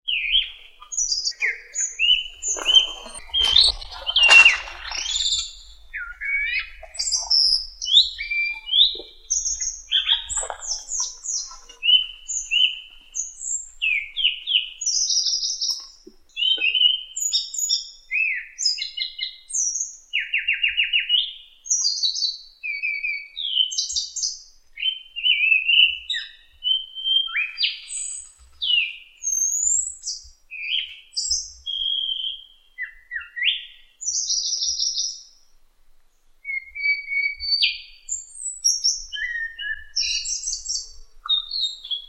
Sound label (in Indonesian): suara anis sulawesi